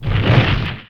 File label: torch; wesnoth